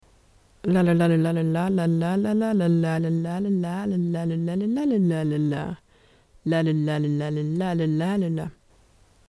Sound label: lala-la-lala-la-lala-la-lala